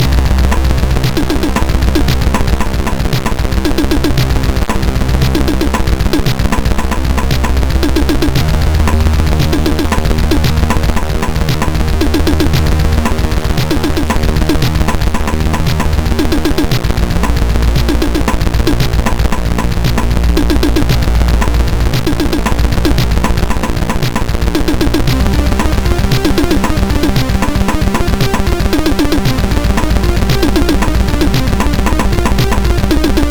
Future Drums, MOS6581R3

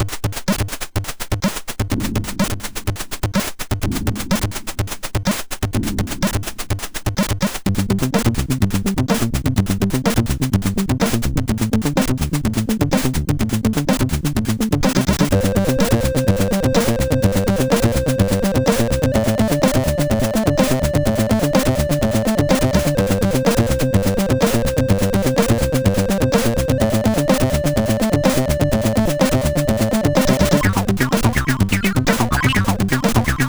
House, sid, MOS6581R4

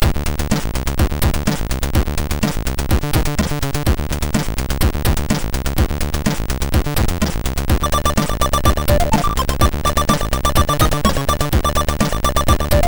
Cutoff Skating, sid MOS6581R3

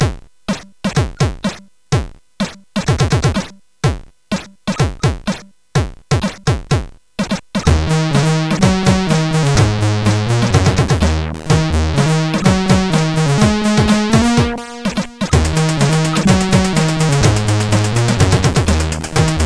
sid, CSG8580; Subway Zax

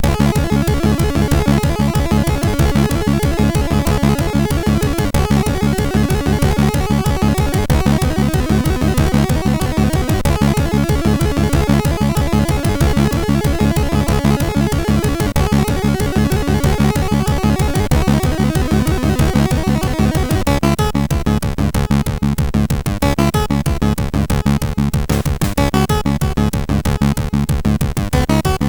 MOS6581R4, sid; hvsc

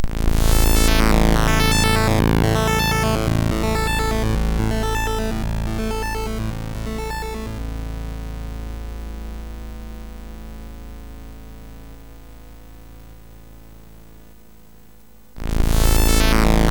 Startup, MOS6581R4; hvsc

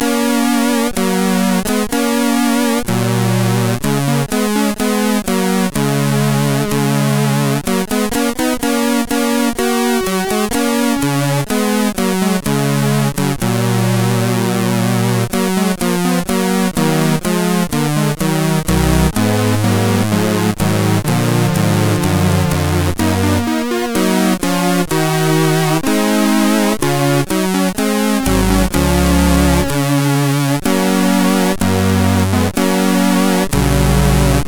sid CSG8580; HVSC; Chor-Judas

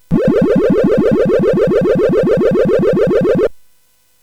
Synth Sample, CSG8580R5; hvsc

